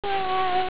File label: meow; kitty